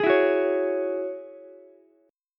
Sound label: Gb 7th Suspended 2nd Gb Ukulele; strummed; JGuitar